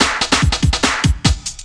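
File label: loop snare drum, 145 BPM, drum & bass; Ueberschall; Drum & Bass Flash Trackz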